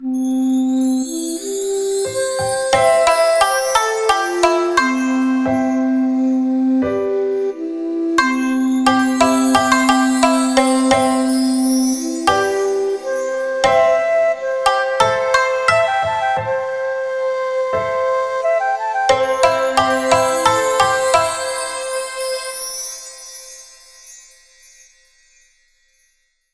和ジングル

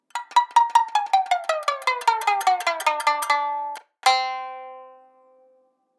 三味線

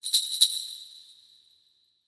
神楽鈴(シャンシャン

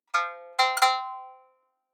三味線

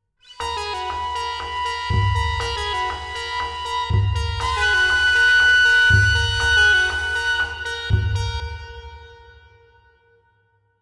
和ジングル ハウス

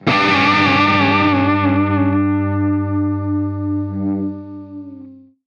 チョーキング

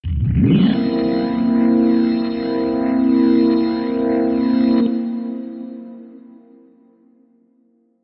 ドラキュラ・バンパイア

